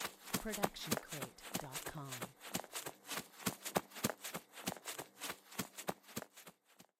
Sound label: running on grass